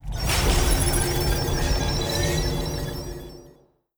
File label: habitat amb; Stellaris; utopia